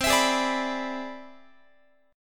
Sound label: C Augmented Major 9th, Guitar; strummed; JGuitar